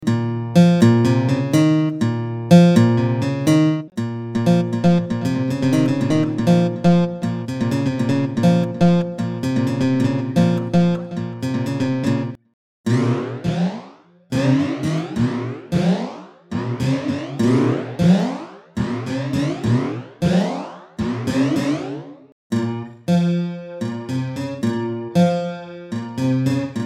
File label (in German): Gitarre mit Echo, erst dry